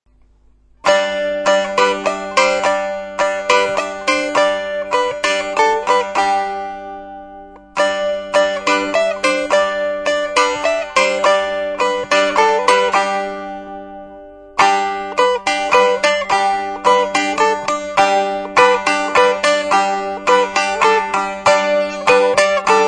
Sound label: strum; strumstick; kommet ihr hirten